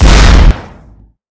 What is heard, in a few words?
Vehicle Collision